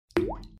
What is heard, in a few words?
water bloop drop